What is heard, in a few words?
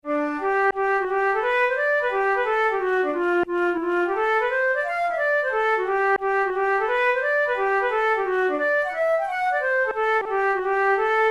hornpipe, flute